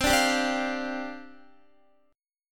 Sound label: C Suspended 2nd Suspended 4th, down, Guitar